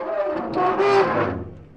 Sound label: turtle emptying done